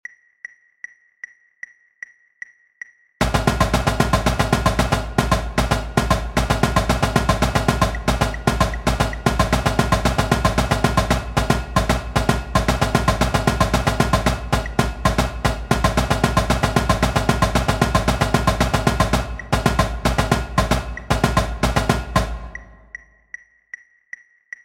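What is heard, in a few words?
sequence timing, Drumline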